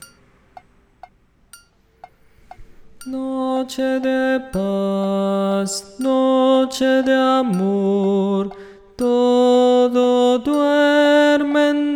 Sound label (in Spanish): Tenor Voz; Noche de Paz